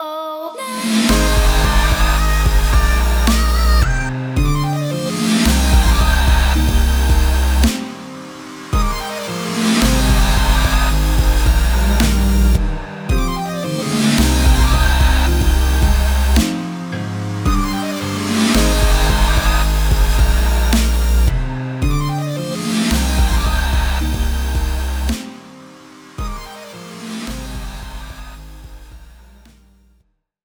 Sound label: level finished